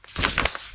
get Book

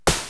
dark Wall

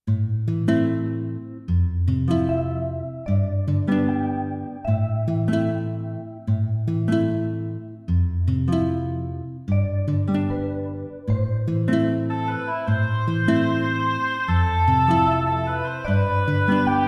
Dungeon

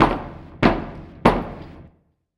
Move

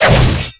shoes

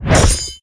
Attack

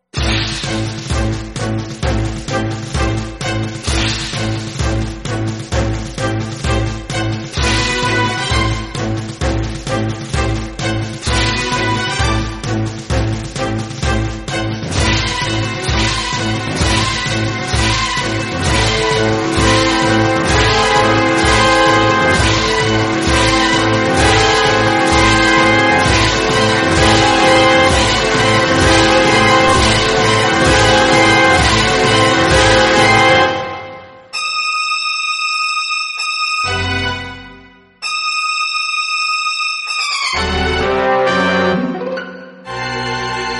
battle

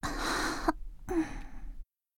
Breathing

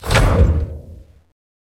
Dark Click

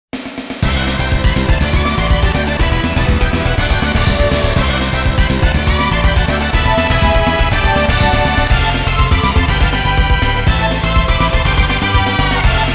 bgm

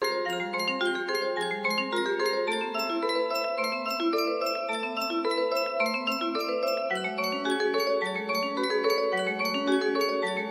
bgm

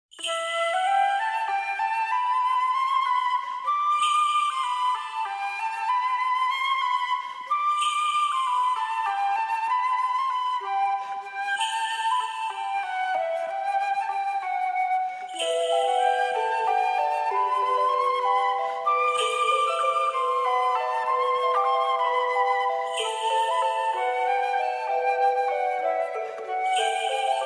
bgm

